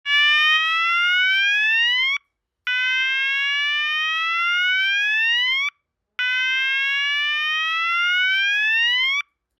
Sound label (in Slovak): tón č. 7, opticko-akustická signalizácia; LED opticko-akustická signalizácia A-MLOAS 24V AC/DC